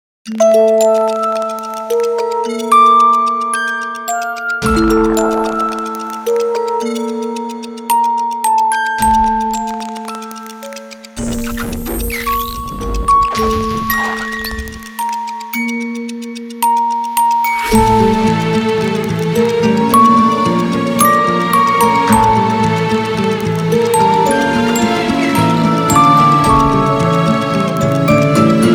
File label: music box multis b chorus